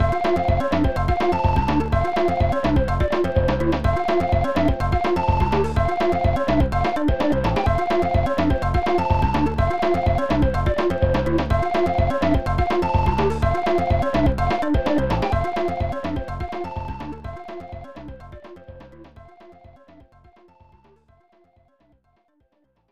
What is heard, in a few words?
Power Pellet; pac in time 1993 snes